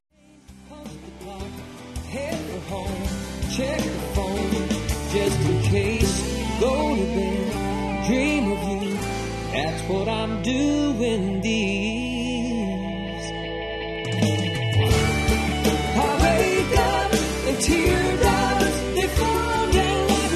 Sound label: with band